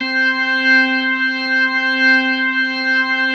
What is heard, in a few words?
C4S, B3